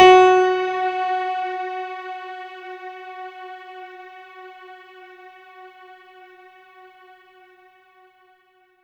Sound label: F#4, synth; 90 s Sample CDs; NiceBeats; SynthLine VOL-23